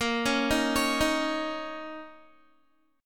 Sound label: A sharp Minor Double Flat 5th, down, Guitar; 4F,4A,5C,5G,5C,6E; arpeggiated